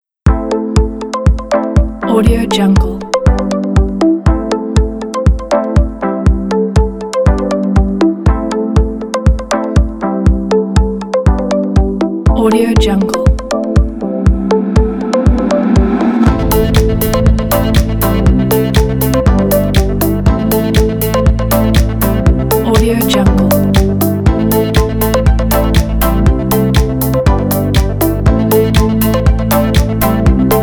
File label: corporate technology pop; acewhale